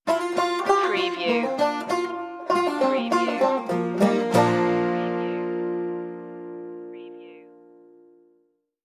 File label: banjo stinger